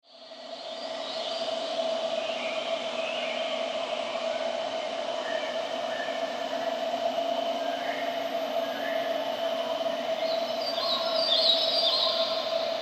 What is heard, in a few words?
Horror Enchanted Forest Active Birds Insects Ambience; Scary Soundscapes